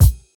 shiny kick drum sound a sharp key, Royality free kick sample tuned to the A# note.